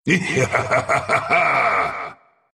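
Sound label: laugh, Russian; dota2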